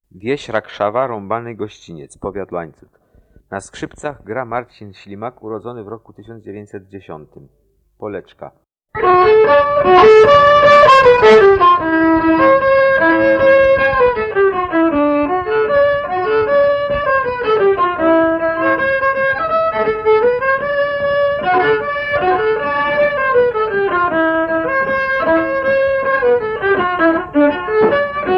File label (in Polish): skrzypce poleczka